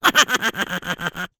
cartoon character laugh high pitched rough male revenge